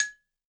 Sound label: BALAFON; Best Service ProSamples vol.52 - World Instruments 2; AKAI